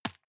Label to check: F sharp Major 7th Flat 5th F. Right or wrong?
Wrong